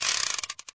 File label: roll out; weapons